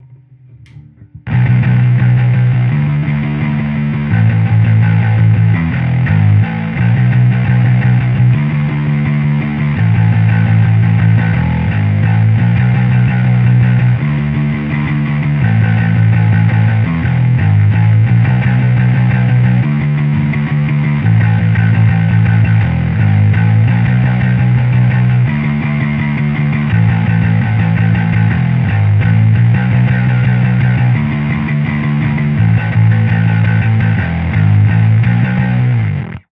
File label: Crush 100 Full Fuzz Punk